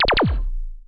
laser

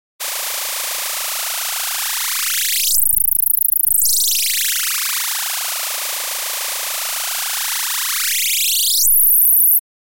Oscillating Multi-Pitched Tones Rise & Fall; Electronic Sci-fi

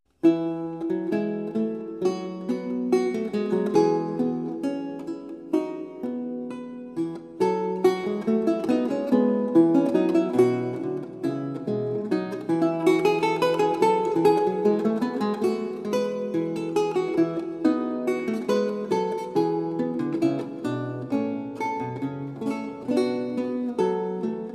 Recercar, on renaissance lute

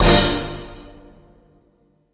Breaking News, jingles

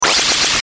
swush

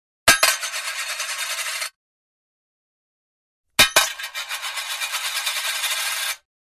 Coin Drop & Spin; Hollywood Edge Foley Sound Library

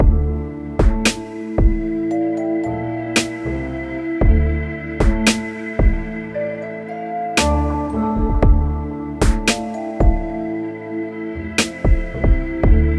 Talk Over Me, music beds

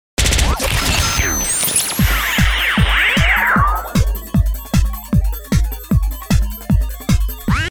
Sparkle Duck Groove; DJ sounds; Mtrax

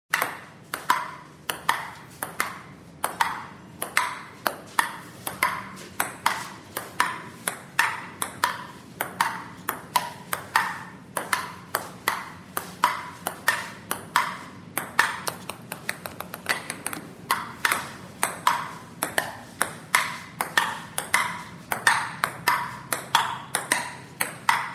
Ping Pong; SOUND EFFECTS1

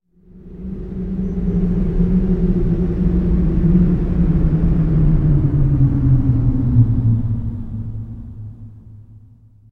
Wind - Bass eerie processed whoosh by x1; Hollywood Edge Sound Designers Tool Kit

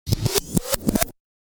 Wind It Back; DJ sounds

